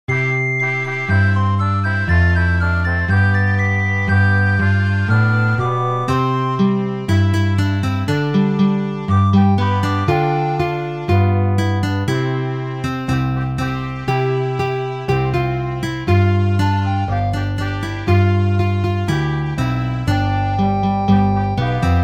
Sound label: instrumental